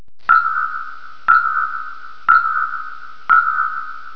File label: station